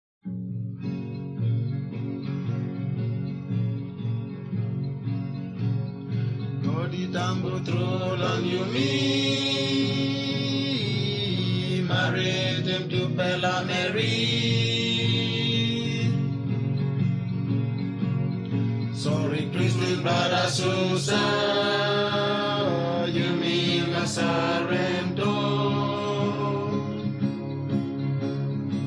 ramu singers tok pisin; png nt drama

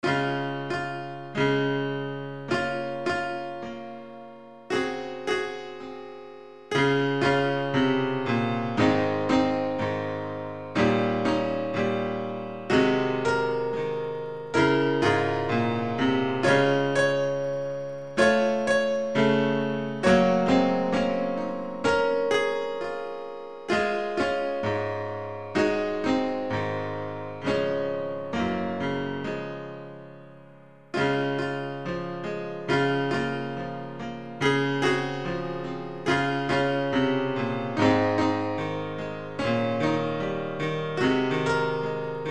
instrumental hymns; we are climbing jacobs ladder

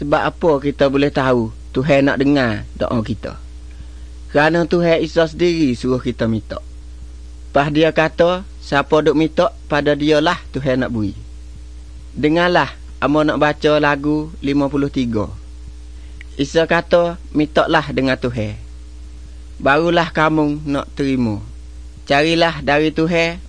songs malay pattani

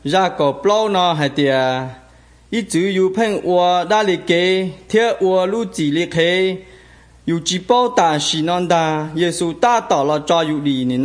songs hmong white

